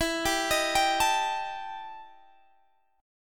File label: E Minor Major 7th Double Flat 5th, Guitar, Half Step Down